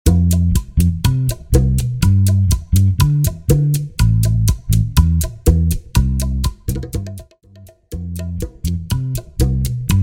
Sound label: Bachata Project 122 BPM; bootleg bachata remix